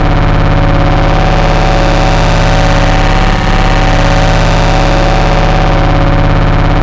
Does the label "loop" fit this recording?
Yes